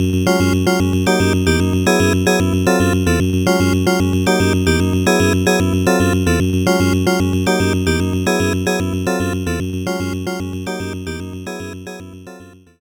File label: Countdown; Paint Chase; UFO 50 (2024)